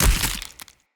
Claw Large Impact Flesh, Enemy Unarmed; Weapon Impacts

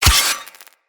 Melee Sword Impact Robotic Flesh

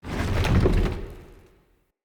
Bookshelf Move Start B; Movers

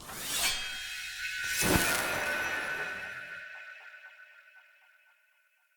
Energy Blade Summon; Weapons; Swords

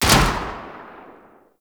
Rifle Shot Alt; Warframe; Tenno; Sundial Rifle

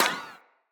Bullet Impact Ricochet

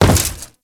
Step Default, Footsteps; Warframe; Grineer

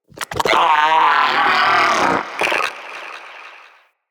Shrimp Lantern Vocal Alerted, Creatures; Baliq

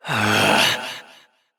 Evil Twin Doppelganger Frustration; Battle Barks; Warframe; EntratiDoppelganger

